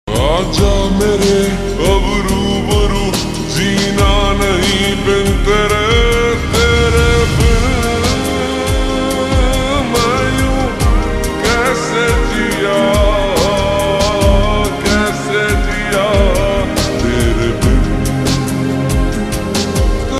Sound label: Ringtone slowed and reverb